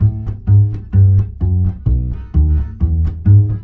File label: BASS, Balkanish Traditions